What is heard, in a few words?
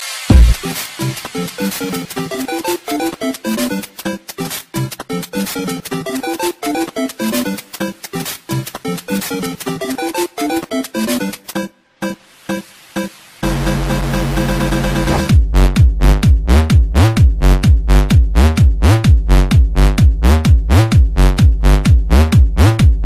Electro